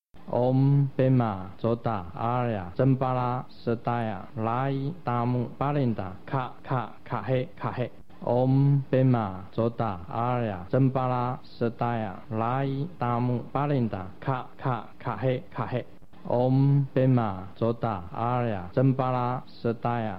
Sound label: White Jambhala Washing Mantra